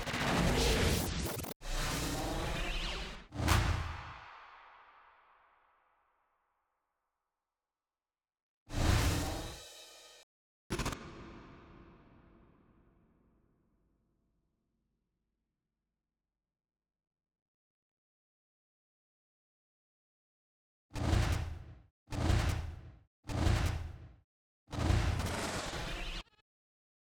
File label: Hip Hop SFX; Unsung Theme Nights